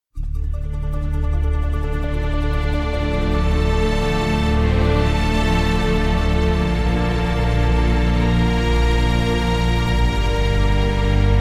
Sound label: Epic Trailer Cinema; motivational epic music